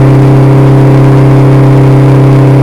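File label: seat on mid,car engine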